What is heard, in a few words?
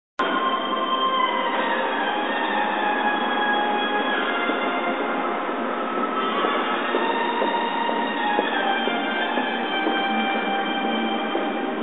saxophone